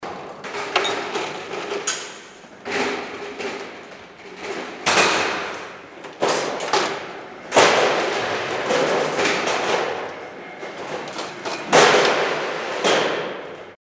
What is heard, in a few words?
bottle case noise